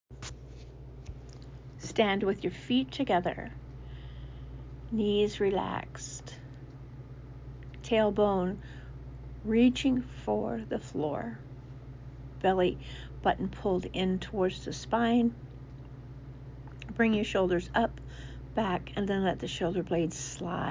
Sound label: Warm up